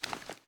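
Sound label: foley assault rifle akilo47 aim down sights up; weapons; viper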